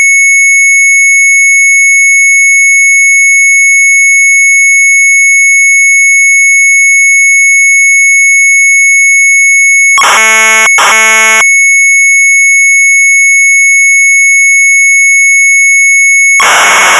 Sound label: MZ800 Tape, sharp; Doortje Stoot; retromelsarchive